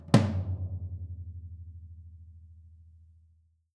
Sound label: SD TOMS, Living Drums; Sampleheads; Peter Erskine